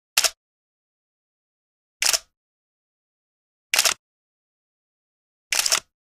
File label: camera shutter